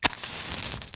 match

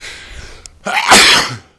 sneeze male

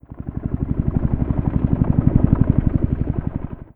heli

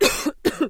cough female

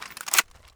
shotgun insert

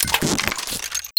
bomb rig

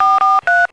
phone dial

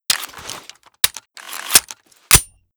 reload

